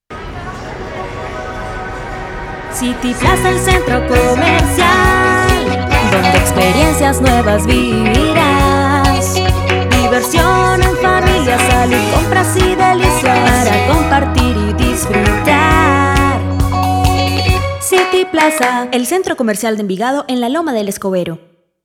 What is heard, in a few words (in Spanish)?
tema comercial; cityplaza